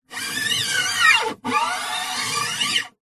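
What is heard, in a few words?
musical guitar acoustic nylon hand slide fret board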